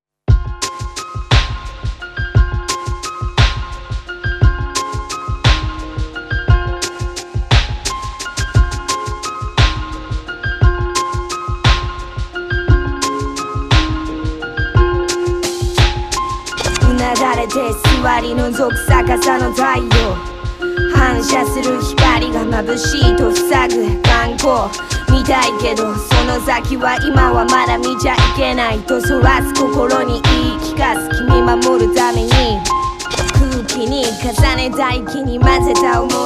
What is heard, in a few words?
Breath